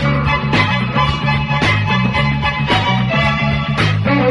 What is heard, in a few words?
strings, phonky loops